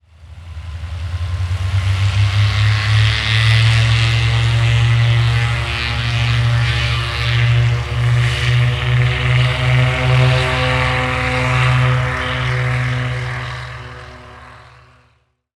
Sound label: propeller plane take off; transportation